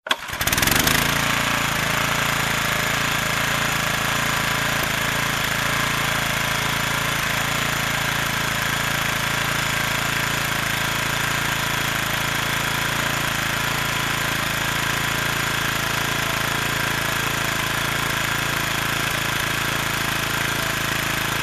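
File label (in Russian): звуки гидравлического насоса звуки поддержки напора давления в насосе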